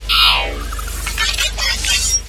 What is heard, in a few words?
Small Laser Charge; enemies